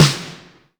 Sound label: LW RM SNAR, Drum Mashines, SR-16 DRUMS; EdgeSounds